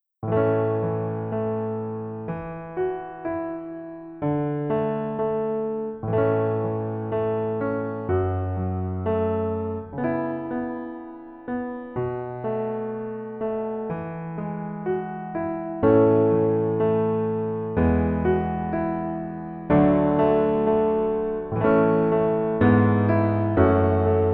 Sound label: Piano